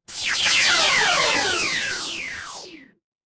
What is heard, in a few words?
magic scifi laser